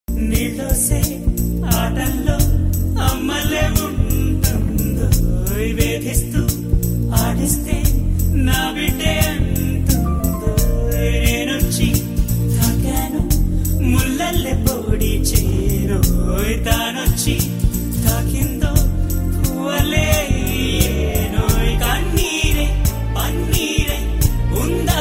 telugu love songs; sakhi

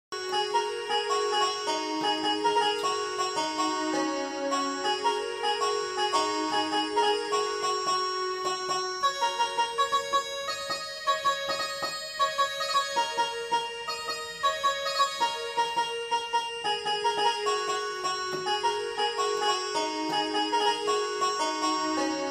banjo tune cover song instrumental

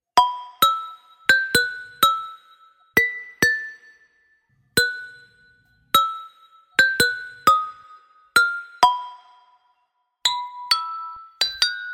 bottle music vs glass music

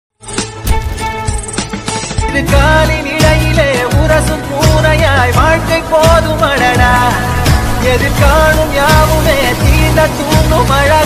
travel bgm song

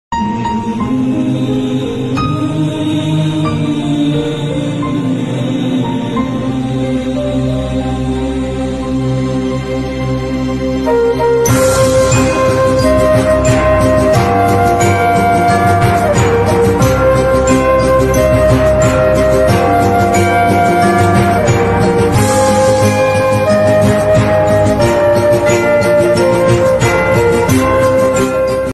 feel the bgm 7g rainbow colony bgm

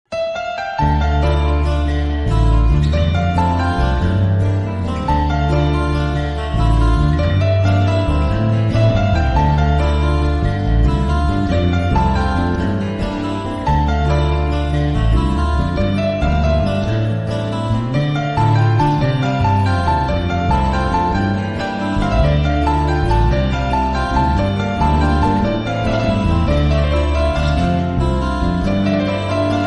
raghuvaran btech, telugu

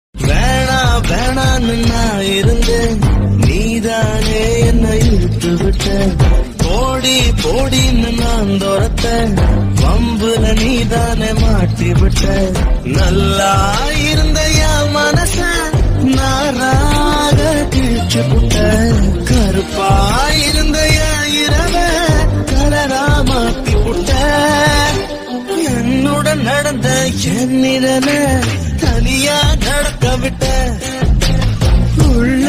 sad bgm ringtone; aaru; surya

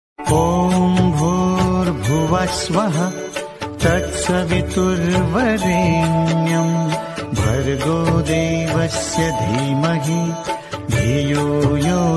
Gayatri mantra ringtone devotional

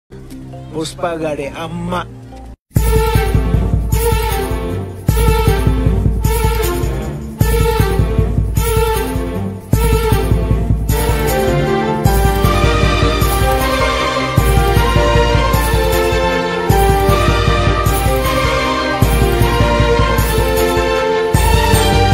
amma bgm song

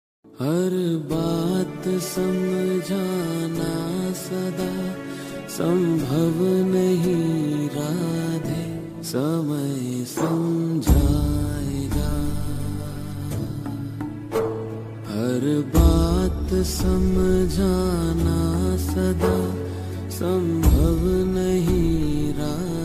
love ringtone; radha rani; radha krishna ringtone

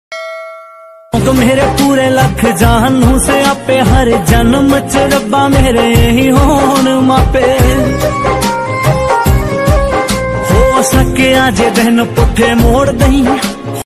punjabi sad song ringtone

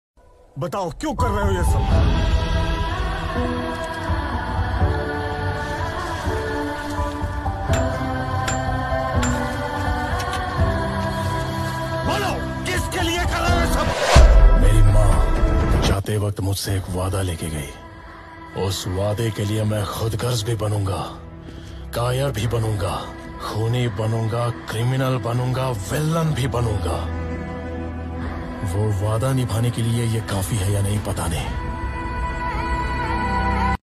lofi song love; kgf

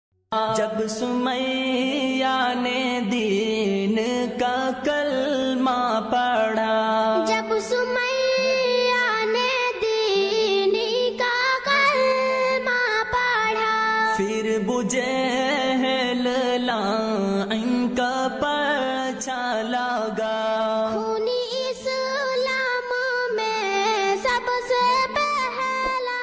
islami ringtone naat sharif

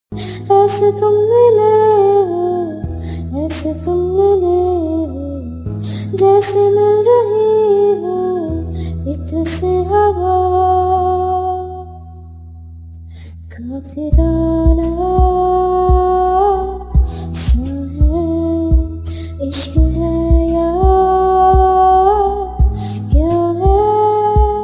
song cover

